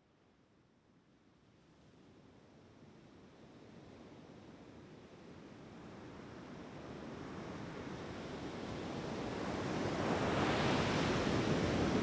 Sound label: Pacific Surf & Songbirds; Echoes of Nature - Morning Songbirds